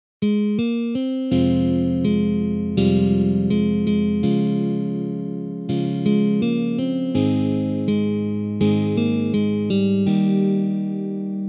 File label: Verse Slow Tempo, guitar